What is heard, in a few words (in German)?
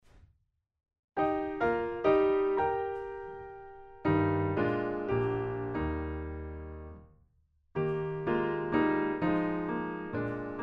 Playback Klavier; Playbacks; Warnung; Koessler